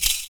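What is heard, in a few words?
PERC, percussions; Univers Sons - Raricussions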